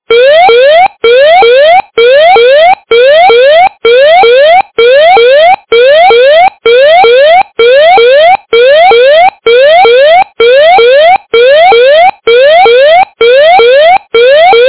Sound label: alarm